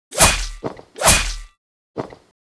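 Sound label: Mob attack; Bossmob; 욱일승천류; 귀장 아베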